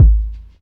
reverb kick one shot g sharp key, kick tuned to the G# note. Loudest frequency: 78Hz; kick drum samples; one shots